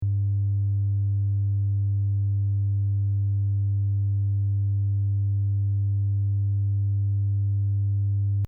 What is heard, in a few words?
A 100Hz